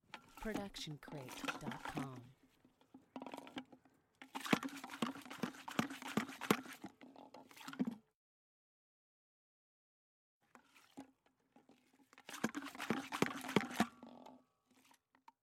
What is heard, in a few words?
golf ball hand washing machine stereo; soundscrate